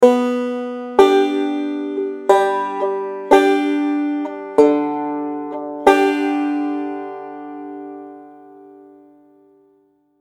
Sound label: Thumb Pinch Roll